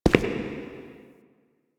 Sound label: Shadow Figure Steps; Warframe; The New War; Zariman Shadow